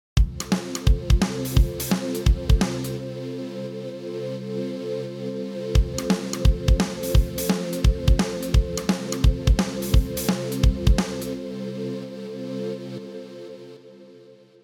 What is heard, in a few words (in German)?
Sidechain 2c Pad Drums Kompressor moduliert Pad via Ghostdrums